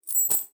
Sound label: Coins